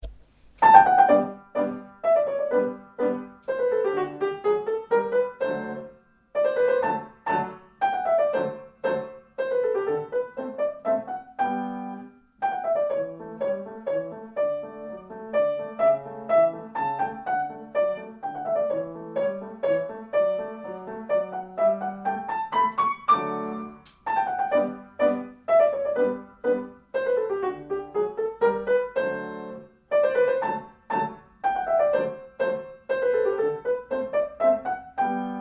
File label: Military Minuet